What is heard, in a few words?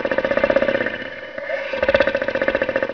GRUNT